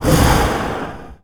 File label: dragon smoke